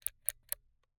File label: Camera, Kinusa K77, 50mm lens, plastic, serrated, lens adjust, slow; Eiravaein Works; Vaeyan IV